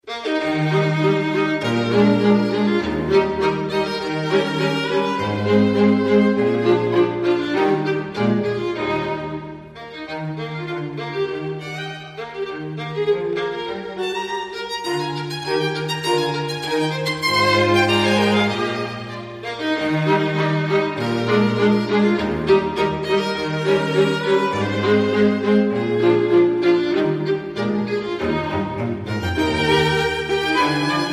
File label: String Quartet in E-flat Major; Op.33, No. 2, 'The Joke' Movement I